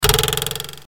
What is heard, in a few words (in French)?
Ressort